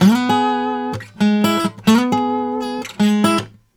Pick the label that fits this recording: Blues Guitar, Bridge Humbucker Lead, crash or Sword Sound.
Blues Guitar